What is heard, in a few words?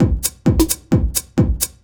Dance Drumloop; Drums; Dance Beats